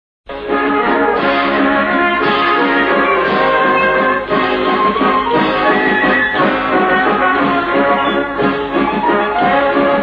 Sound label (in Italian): mazurka